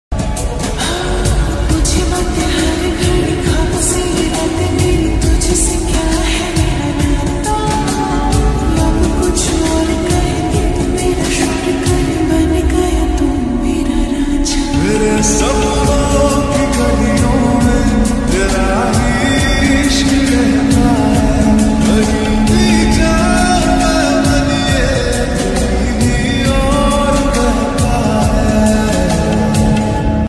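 slowed reverb ultra lofi music song romantic ringtone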